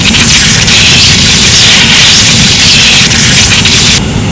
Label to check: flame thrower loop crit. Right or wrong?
Right